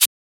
Shaker

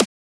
Transient; FLPRO

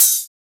Open Hat; FLPRO

